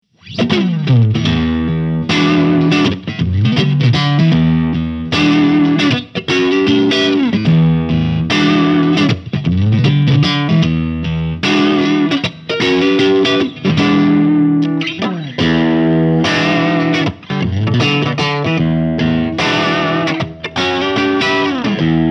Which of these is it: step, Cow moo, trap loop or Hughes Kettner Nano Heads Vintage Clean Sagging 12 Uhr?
Hughes Kettner Nano Heads Vintage Clean Sagging 12 Uhr